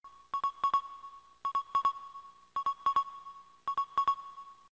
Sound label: wii loading sound